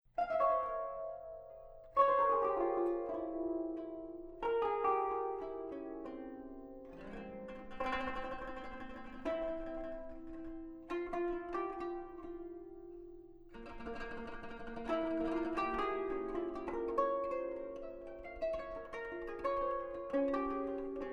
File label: kanun